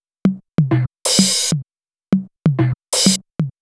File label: Vocoder Loop, 128 BPM; Vengeance Samples; VTDS2 Song Kits; Vocoder Mother Earth